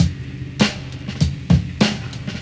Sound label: dirty beats